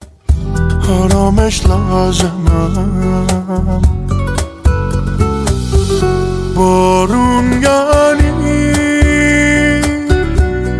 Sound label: Guitar